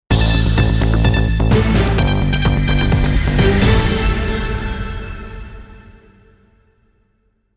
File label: Update Sting; Five News 2008